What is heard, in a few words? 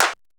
CLAPS RX5; Greytsounds VOL-1